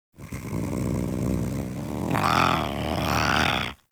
Feral Vocal Idle D; Warframe; Catbrow

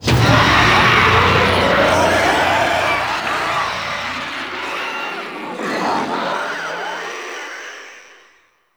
Zoo Wave Start Stinger; Warframe; NineteenNinetyNine; Stage10TheZoo

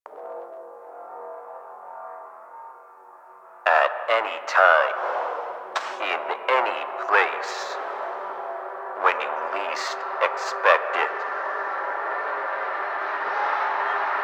Television Audio; Ambience; Props; Warframe; Nineteen Ninety Nine

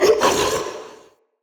Male Vocal Pain, Enemy; Mockery; Mockeries

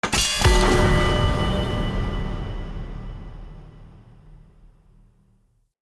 Helmet Drop Stun; Friendly; Tuvalkane; Discovery

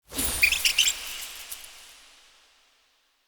Hare Mote; Animal Motes

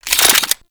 Chain Lightning Rifle Reload; Warframe; Corpus

